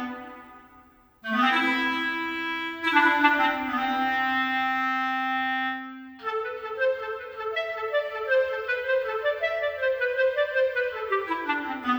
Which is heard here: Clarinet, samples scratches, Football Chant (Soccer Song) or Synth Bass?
Clarinet